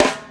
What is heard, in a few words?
clean snare drum sample c key